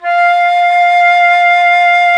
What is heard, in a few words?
FLT XFLT, Flutes; Club-50 - Foundations Roland